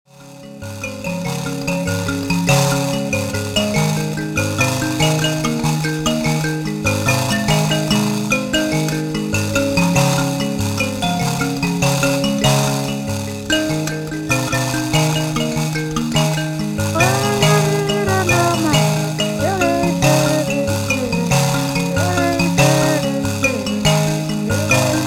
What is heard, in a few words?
mbira